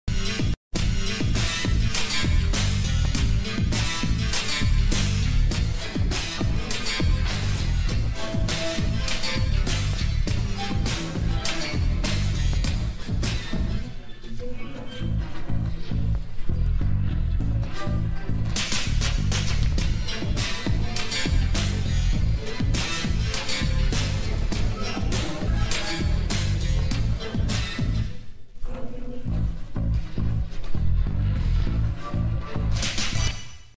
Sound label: love bgm; vintage vijay; thalapathy vijay